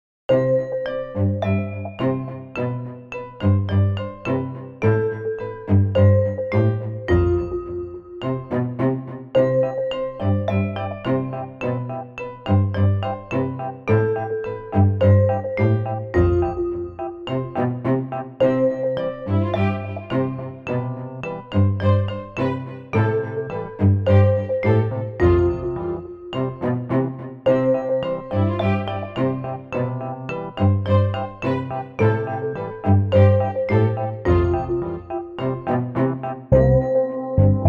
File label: Funny and Cute No Drums